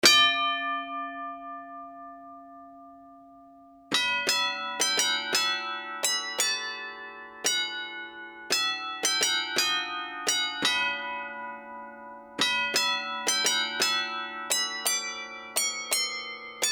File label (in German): tramglockenspiel; hedwig